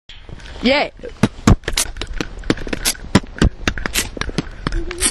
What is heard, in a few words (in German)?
Percussion mit dem Mund